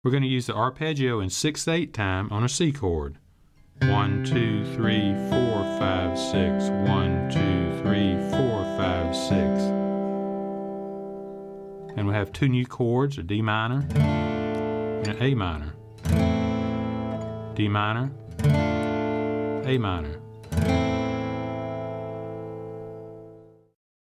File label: Arpeggio